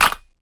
raw clap sample g sharp key, Royality free clap one shot tuned to the G# note. Loudest frequency: 2904Hz; single hits one shots